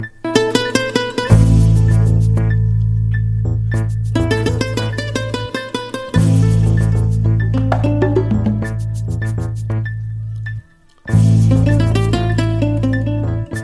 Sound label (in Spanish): romantico castellano tono de llamada